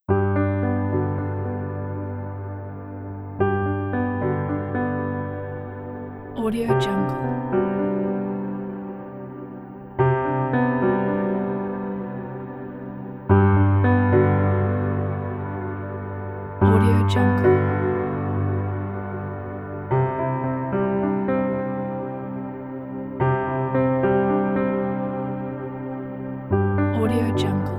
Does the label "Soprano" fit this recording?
No